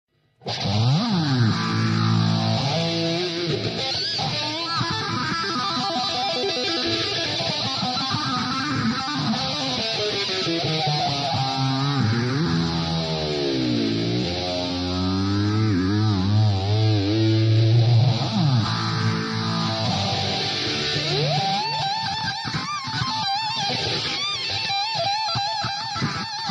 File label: girl playing guitar cover solo